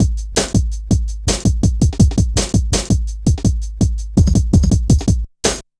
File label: Ezee Rolla, Drum Loops